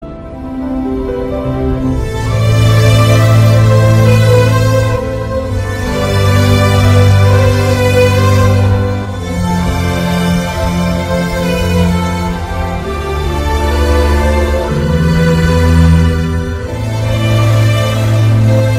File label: heart breaking love music bgm